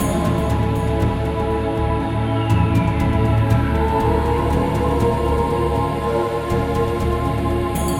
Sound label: Healing Loop; The Royal Palace; prince of persia 2008 gamerip